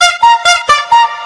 Clown Horn March Sounds Car Horn

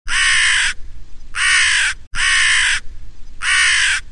Black Crow Bird Sounds Car Horn

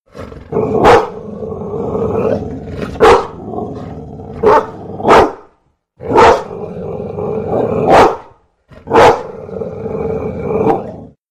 Growling Barking Sounds Car Horn; Georgia Bulldogs

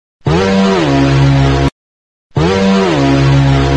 Cartoon Whammy Sounds Car Horn

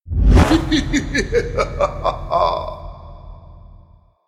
Halloween Sounds Car Horn; Count Dracula

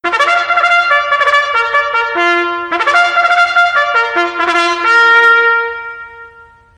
Military Trumpet First Call Sounds Car Horn

